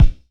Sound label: Kick Hardware; Airwindows